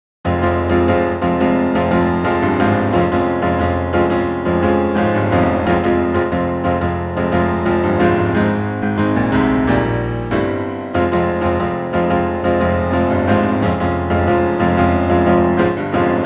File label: riff